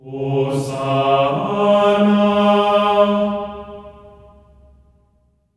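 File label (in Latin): hosanna C#4; Spectrasonics; Symphony of Voices; GR HOSANA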